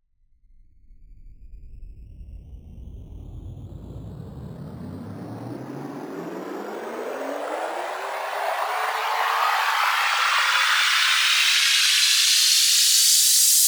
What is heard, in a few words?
Riser; Trap and Dubstep Samples 2018